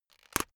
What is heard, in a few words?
plastic slowly pressured